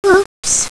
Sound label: bump; quake2; buttercup